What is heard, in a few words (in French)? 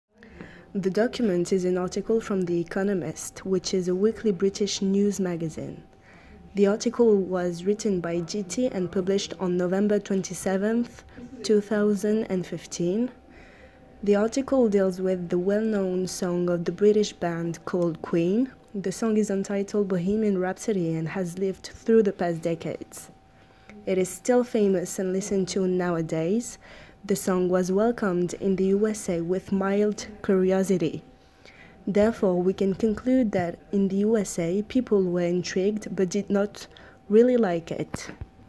Voix